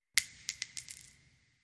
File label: Shell Case Sngle Tube, shell casing movement